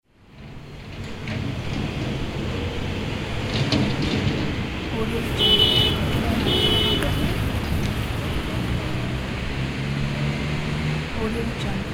a city

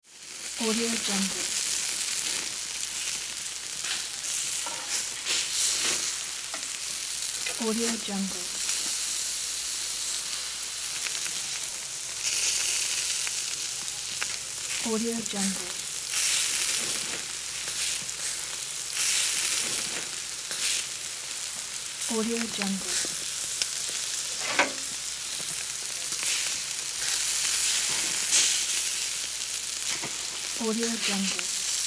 barbecue grilling meat sizzle

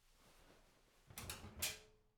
clothes pile into dryer

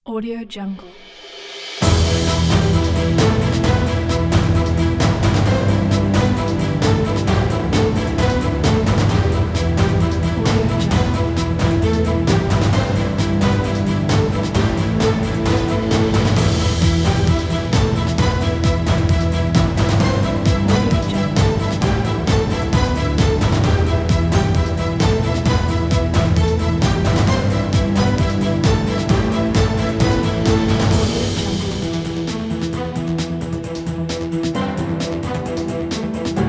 cinematic pack

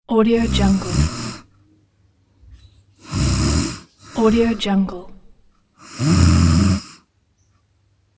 snoring

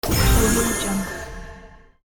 bonus game sfx

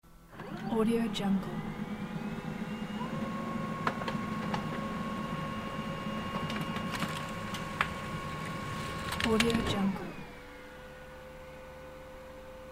laser printer printing

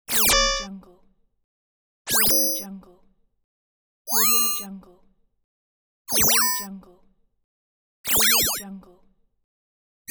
game ui buttons sound fx

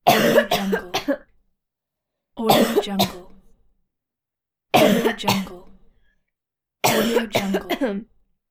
woman coughing

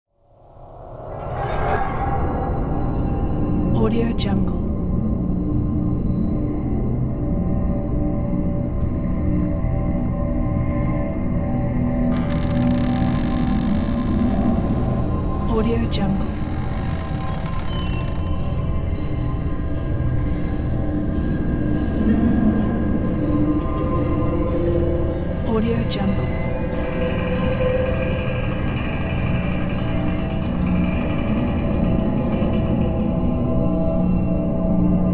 horror ambient

